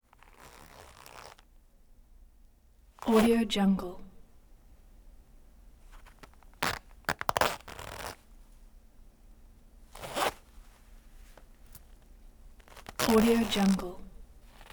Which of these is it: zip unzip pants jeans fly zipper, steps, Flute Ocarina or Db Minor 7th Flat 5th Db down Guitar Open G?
zip unzip pants jeans fly zipper